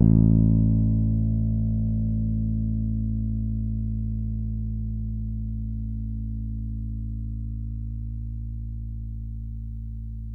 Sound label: finger, bass